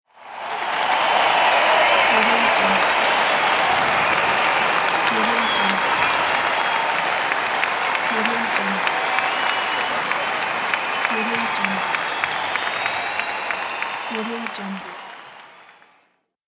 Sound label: crowd cheering